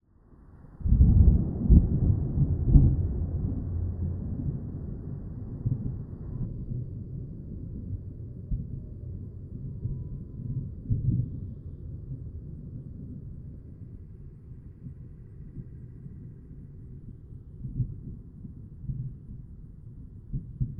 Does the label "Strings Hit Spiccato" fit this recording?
No